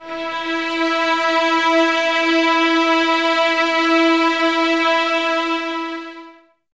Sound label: Violins E 4, String Ensembles; Miroslav Vitous; 11 Violins